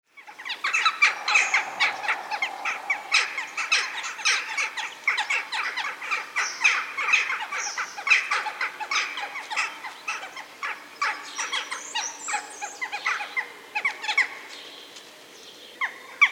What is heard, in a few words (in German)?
Dohle